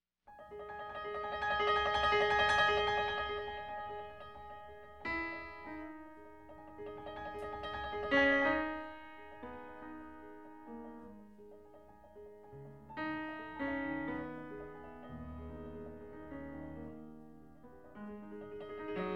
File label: piano